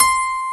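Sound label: piano attack C6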